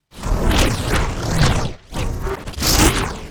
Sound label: wooshes transform sweetener